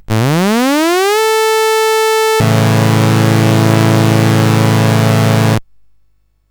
sid CSG8580R5; Windwalker

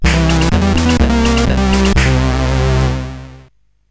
T-Rex 64, CSG8580R5

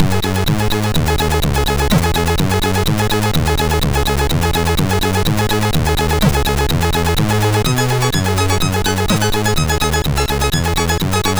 sid MOS6581R4

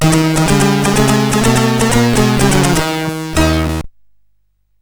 Tilt, CSG8580R5

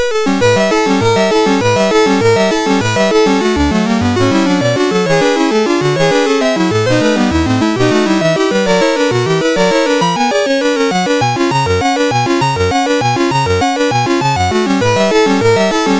sid MOS6581R2; Herby in Action

